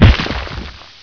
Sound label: stone; CHASM